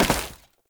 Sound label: Step Ice; Warframe; Oraxia; Foley